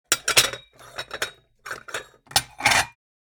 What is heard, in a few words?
Kitchen furniture stacking plates